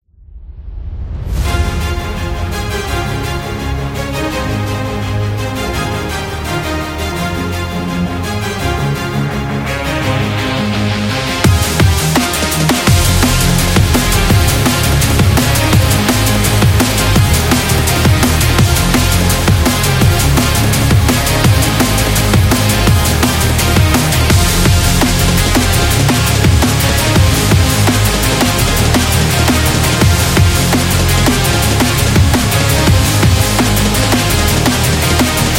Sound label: The Sports Drum Bass